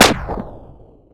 fire; sven; sentry

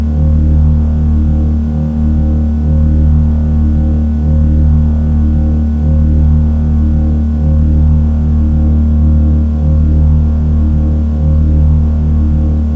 hum; sven; caged

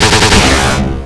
m16 scifi, weapons

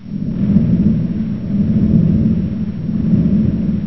fan; sven; aomclassic

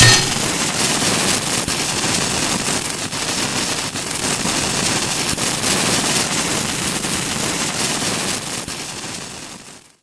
flare hit; weapons

